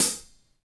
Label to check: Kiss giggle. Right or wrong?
Wrong